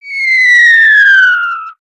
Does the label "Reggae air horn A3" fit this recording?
No